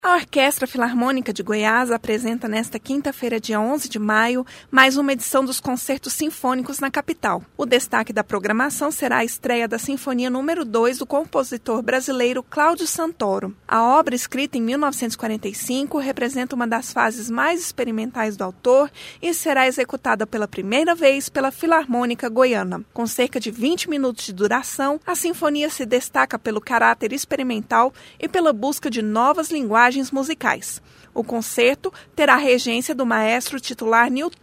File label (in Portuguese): ORQUESTRA; MARILIA; filarmonica de goias; claudio santoro; goiania